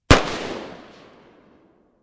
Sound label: M16 Field Fire, Assault Rifle; Assault Rifles; Guns; VRSonic